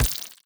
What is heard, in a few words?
step layer, headcrab black movement